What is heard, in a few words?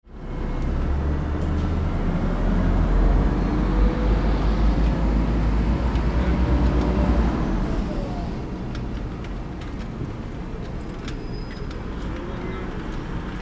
city street noise